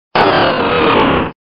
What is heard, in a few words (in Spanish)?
Grito de Salamence